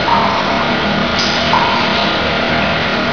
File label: hum drip, rain; nature; hl2